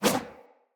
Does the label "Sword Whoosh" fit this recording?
Yes